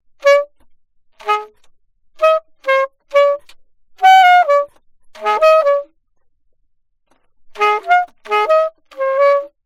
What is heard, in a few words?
altosax